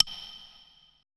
E HIBLOCK, ETH PERC

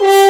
FRENCH G 3, ORCH; AKAI S-Series CD-ROM Sound Library VOL-2

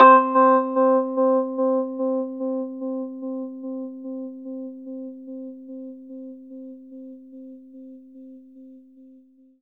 RHODES OCTAVE C; 3D Sound; 3D Keys VOL-1

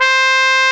BRASS C4; AKAI S6000 CD-ROM - Volume 1; BrassWind; WIND&HORN

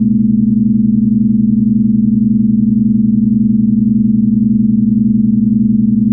Drone Static; Drums & Drones

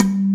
mini drums; Pro-Rec - Synthsonic

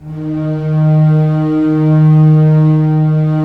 STR SEC, Roland LA Composer, Sec Mute St

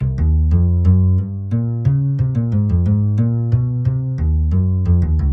Doppel Bass 90 C, Loops n Lines, 90bpm

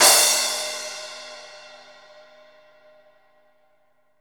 CRASH L, DRUMKIT

